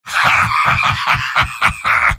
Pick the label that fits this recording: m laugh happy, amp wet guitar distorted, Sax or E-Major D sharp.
m laugh happy